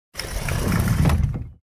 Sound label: Metal sliding across concrete metal rail